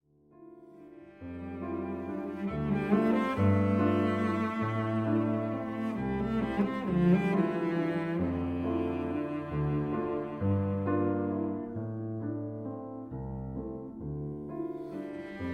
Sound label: Tango; Summerer